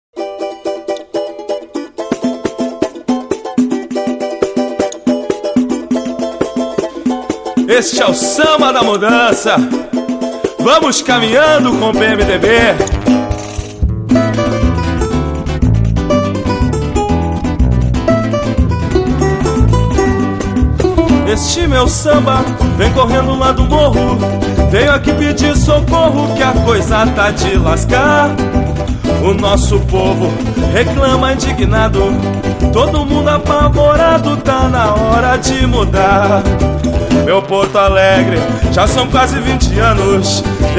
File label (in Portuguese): samba